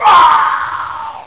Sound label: death; action-quake2; quakeguy